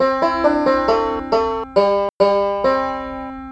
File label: measures damped synth